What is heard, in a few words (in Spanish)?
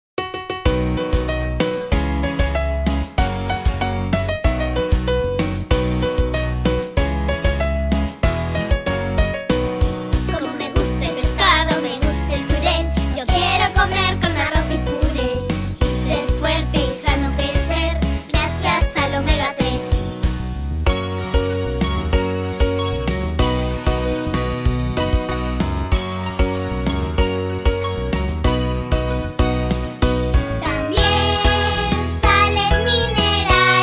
Karaoke